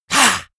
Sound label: monster hiss; koerpergeraeusche; comedy-cartoon; soundpool